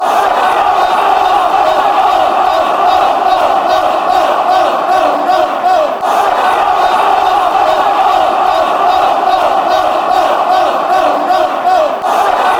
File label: off, chants